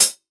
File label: natural hat one shot f key